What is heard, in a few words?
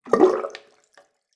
water basin plug pull bathroom; zapsplat